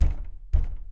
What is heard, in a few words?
knock